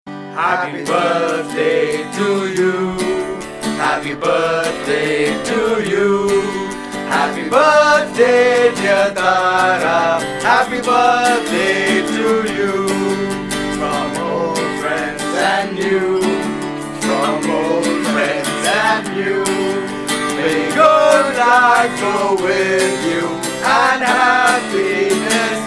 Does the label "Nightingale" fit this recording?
No